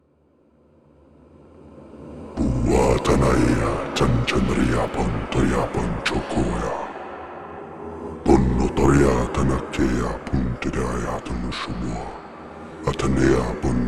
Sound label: Demon Chant